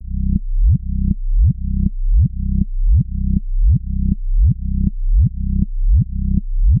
throb C4 WHUM WHUM

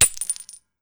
small Glass TINKLE

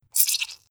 blood SPLRT

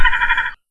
seagull Chatter CHUGUGUG

